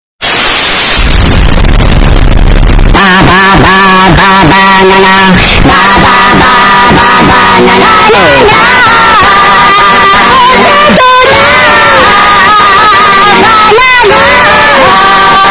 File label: panic